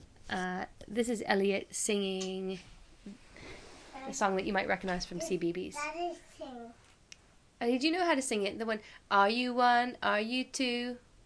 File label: 2 year old sings happy birthday song; cbeebies